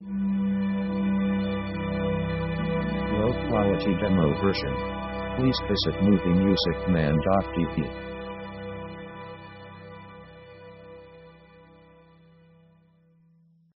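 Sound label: orchestra slow fade chords; Basically slow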